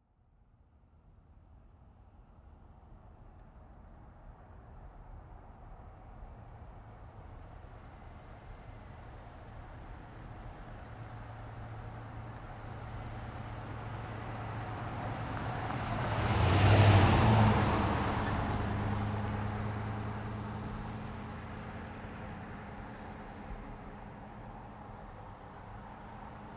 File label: Truck GMC Savana By Slow; 2010 GMC Savana; Trucks (Sound Effects:Vehicles); Big Room Sound